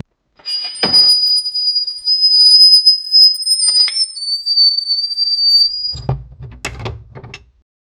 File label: an old chest, chest opening